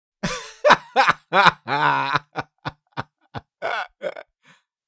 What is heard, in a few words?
Laughing man loud shouting laugh